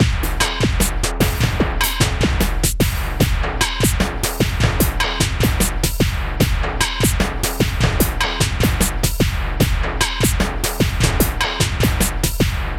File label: PHATT BEA